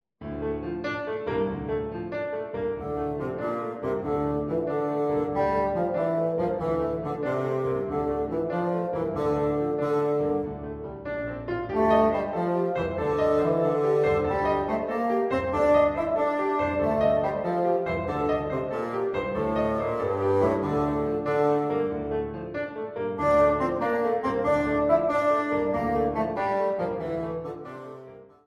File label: Bodhran, Bassoon; A short extract from Bodhrán; Grade 2